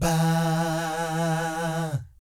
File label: VIB FX, Jazz FX